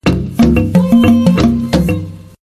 Samsung Underretning ringetone

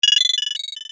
flyv forbi

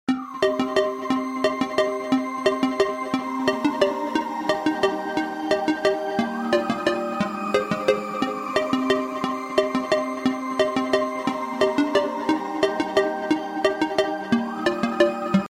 ny iphone 15 pro max, ringetone

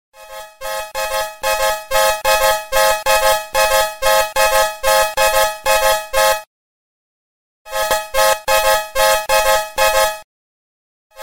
vaekkeur iphone ringetone; Vækkeur Iphone ringetone

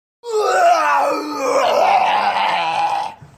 sjove notifikationer, ringetone; mobiltelefon

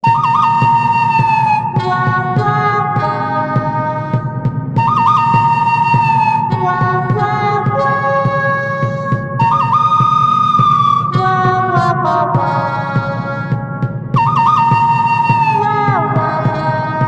western flojte, ringetone

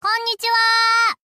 japansk sms, ringetone

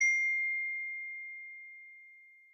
besked lyder, ringetone; mobiltelefon

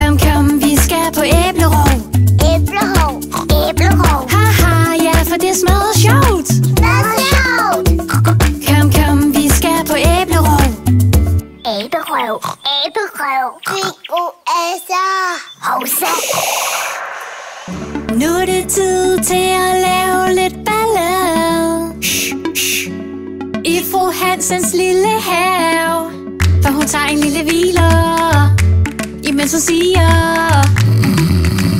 børnemusik; Maja

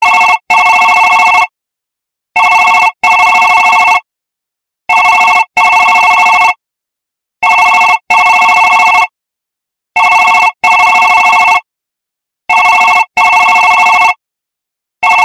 Digital iPhone ringetone

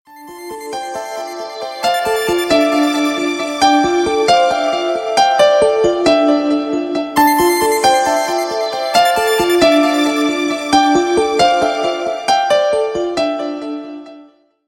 Sony Ericsson ringetone til mobiltelefon

